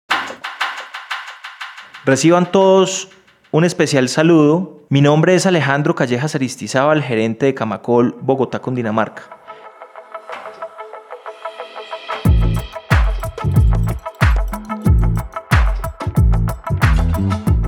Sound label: Trailer